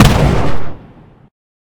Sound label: bullet flechette; ufoai; weapons